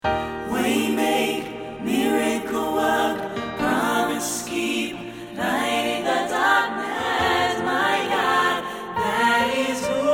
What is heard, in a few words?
alles, choir; Presence op het Malieveld